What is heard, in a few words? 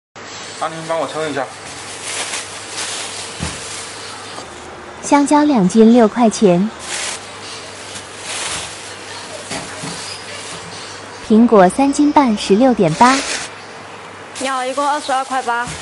laugh; breadradio